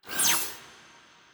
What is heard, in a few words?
e skill cello reborn